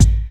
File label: smooth kick drum a key, Royality free steel kick drum sample tuned to the A note; kick drum samples